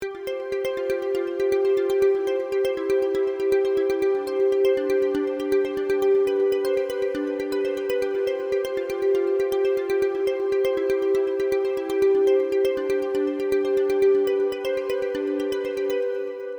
Cool Dupstep Arp

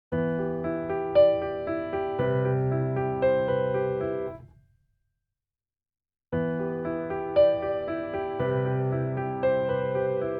PIANO Subtle Space

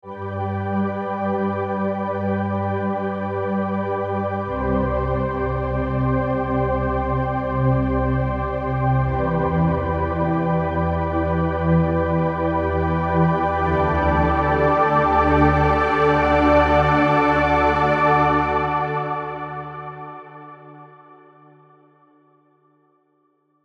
Sizzle Pad High

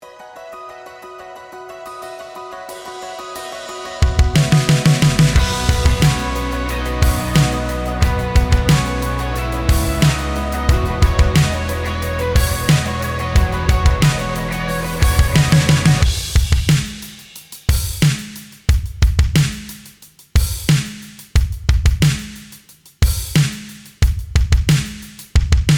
Custom Drum Kit

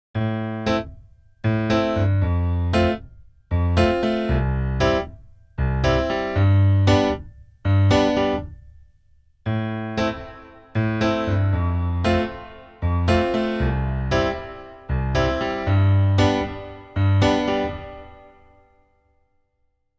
PIANO Slap Hall

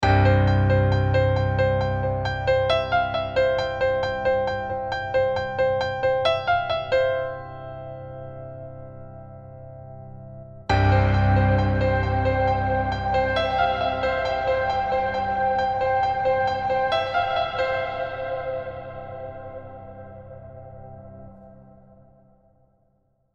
PIANO Chaotic Piano Hall

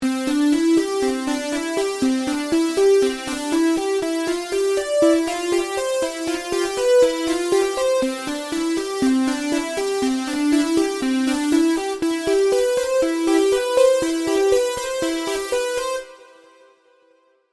WaveTable Lead

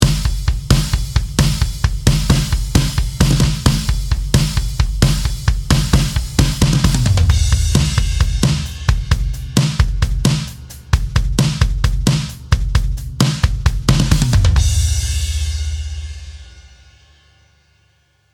Low Tuned Drums

